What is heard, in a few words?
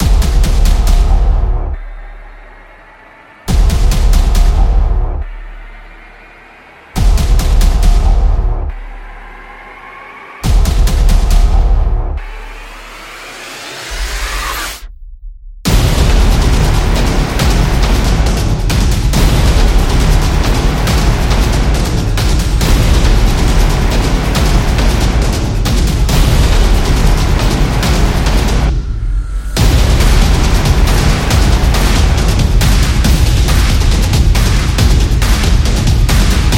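Sports Epic Opener, Football percussion background music inspired by samba rhythm, stadium energy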